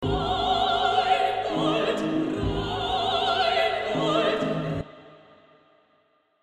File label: Vocal; Rheingold